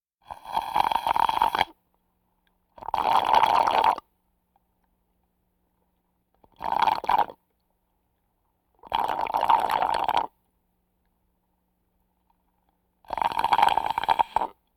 Vocal effects breathing Moaning Baby

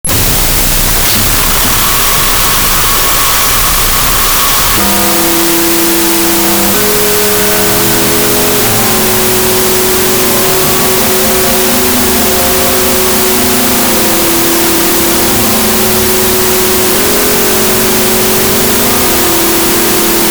Grandfather Clock Strike; 1001 Sound Effects; Foley

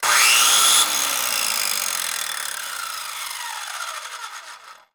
Circular Saw; Tools; 1001 Sound Effects

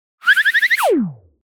Whistle Comedy Run Start; Hollywood Edge; sound designer's toolkit v2

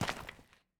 Footsteps; Hero; Blind Survivor

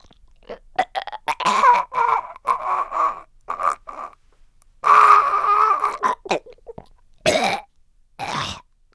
npc male eaten; DeadFright

